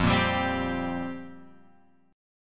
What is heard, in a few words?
F Suspended 2nd Suspended 4th F; Fsus2sus4 Chord {1 1 X 0 1 3} strummed